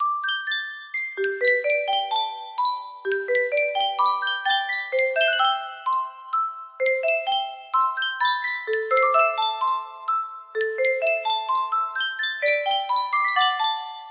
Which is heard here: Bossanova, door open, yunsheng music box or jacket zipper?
yunsheng music box